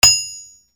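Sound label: percussion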